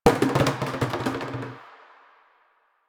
Plastic Hit

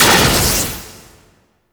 Race Mode Space Mine Beam Stop; Warframe; Ambience; Space Battles

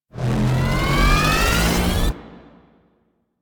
Laser Sweep Charge; Enemies; Weapons; Warframe; Corpus; Arachnoid Camper

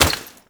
Step Dirt, Footsteps

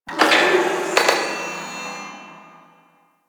Gas Machine Rand; Ambience; Warframe; Corpus Gas City

